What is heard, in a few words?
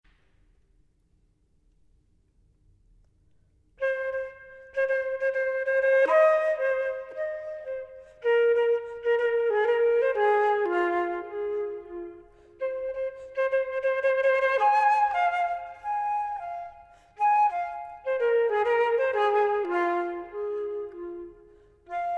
Recitativo, concertina; Beddig Beddig